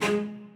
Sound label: strings